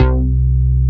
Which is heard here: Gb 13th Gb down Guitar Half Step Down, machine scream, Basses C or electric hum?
Basses C